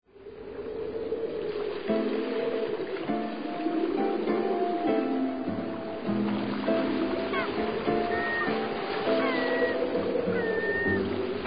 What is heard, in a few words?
Piano Concerto; Grieg